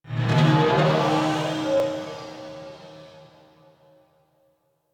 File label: Ship Tech Moan Texture Med, Ambience; Warframe; Corpus Ship Remaster